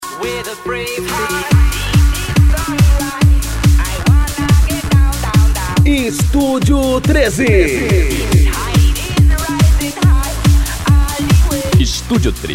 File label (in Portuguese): dance sertanejo remix eletro funk e pancadao; Studio 13